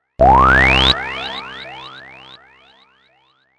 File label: Sms magic